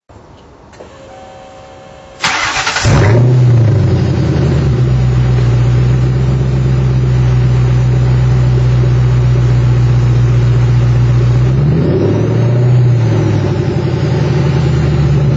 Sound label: 46 mufflers